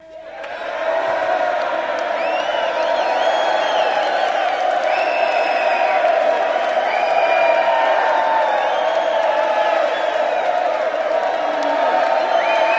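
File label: Crowd Medium Excitement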